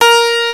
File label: GRAND LO, pianos; fairlight cmi